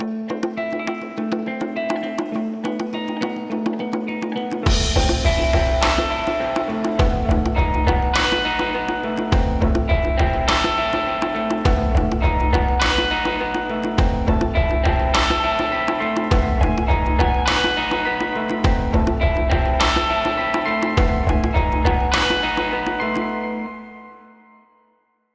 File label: music princess theme